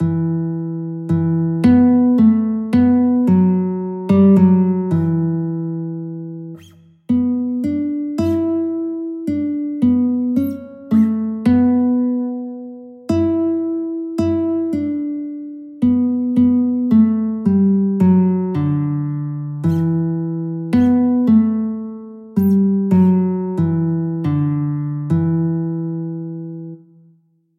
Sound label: guitar; scarborough fair